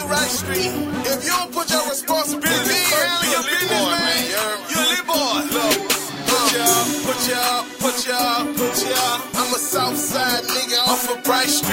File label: Ringtone